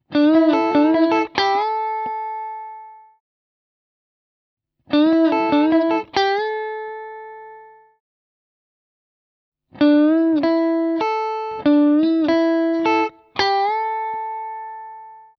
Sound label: Bending Lick, guitar